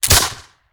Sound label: Musket; Sound Ideas; Guns